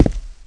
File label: Walk Concrete; Footsteps